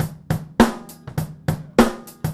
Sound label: Drum loops, Boombap